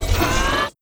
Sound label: active buildings, ui; Stellaris